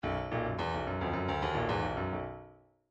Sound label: bdf midi file grooves funk d funk d